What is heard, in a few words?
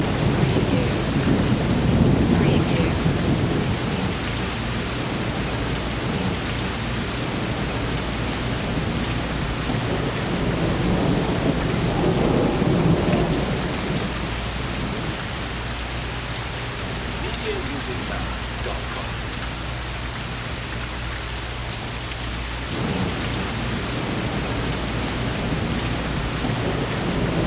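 Thunder Storm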